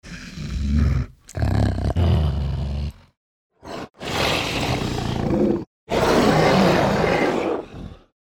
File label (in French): chien dinosaure